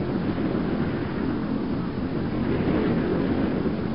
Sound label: windy, ambience; dn3d r